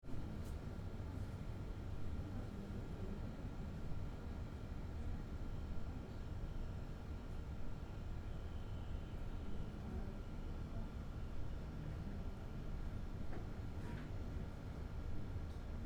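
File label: room tone murmur Hebrew; atmosphere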